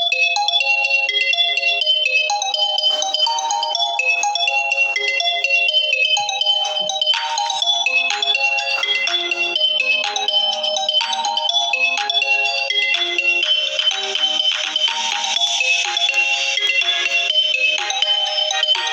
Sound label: VIVO OFFICIAL RINGTONE